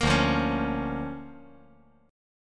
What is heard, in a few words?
D Minor Major 7th Sharp 5th D